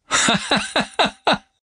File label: laughing sound